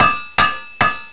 Hammer; Smith hammering a sword

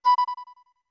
Flute B short; OOT

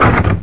Barrel Lock; The giant barrel locking into place

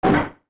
Log Bounce

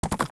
Epona Jump; Epona jumping

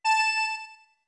Notes Music Box A med; OOT

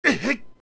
Hit; Dark Link; zelda; OOT

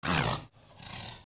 Horse Snort; Ganondorf's horse snorting

